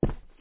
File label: footstep; fear